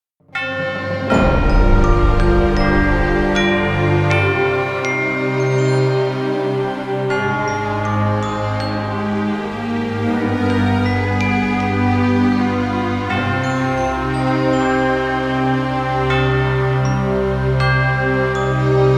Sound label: Chime; Orch Chimes Demos; Chime Era; Felipe Tellez